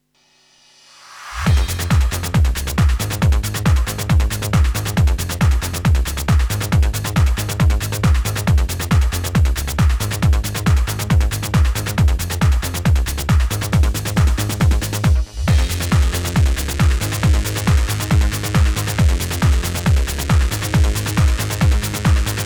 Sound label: instrumental